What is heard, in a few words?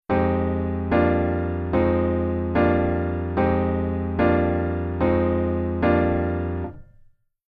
Jazz Waltz Chord Intro